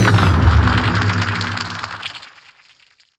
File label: dark artistry damage; dota; grimstroke